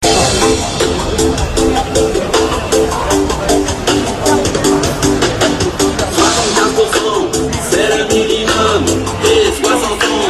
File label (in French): Manif retraites chanson